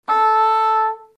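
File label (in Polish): fagot organ; synteza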